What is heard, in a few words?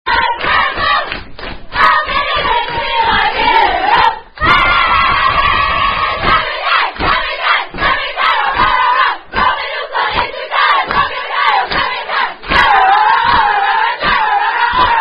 Unknown Sorority Chant; Chi Omega Chants